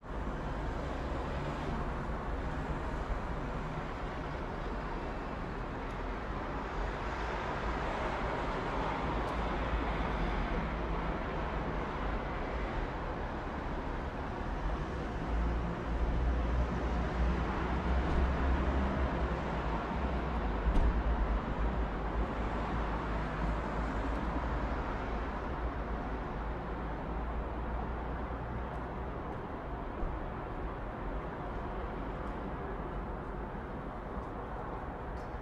Nighttime Neighbourhood White Noise

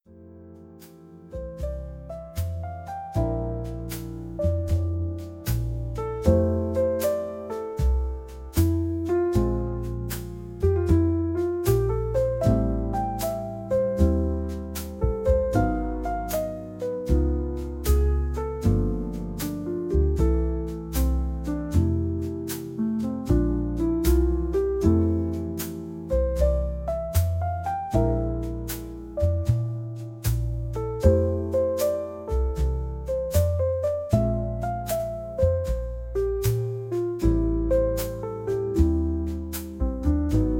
Smooth Jazz

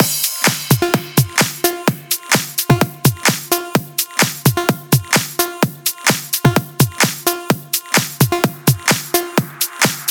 Sax Instrumental Remix

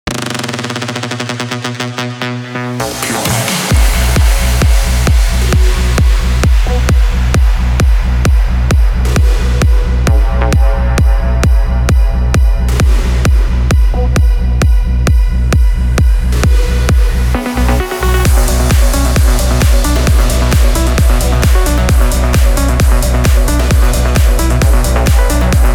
BASS AUTO

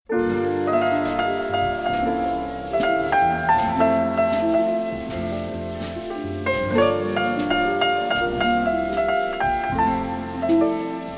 Shaker

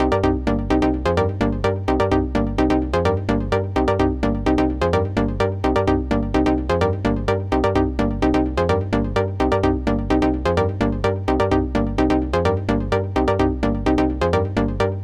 House Key C 128 Bpm

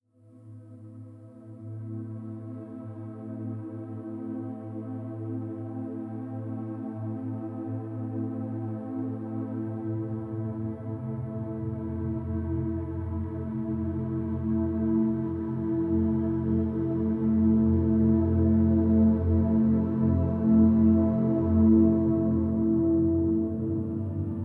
Cat Music

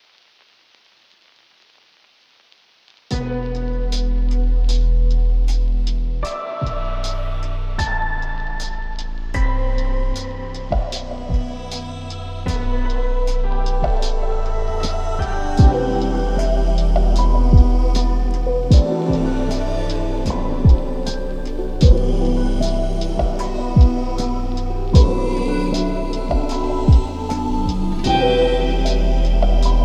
Lofi Old Tape

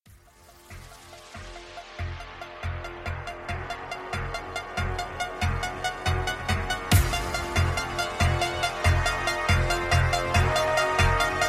Deathstep

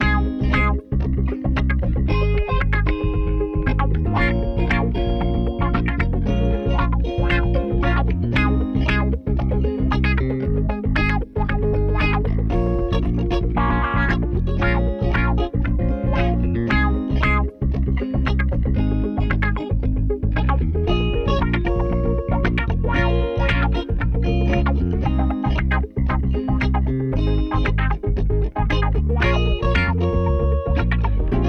Funk Music for Kids

